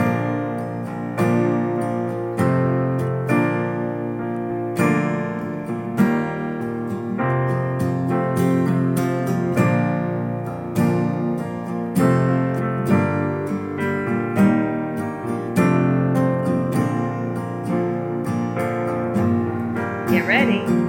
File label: soprano harmony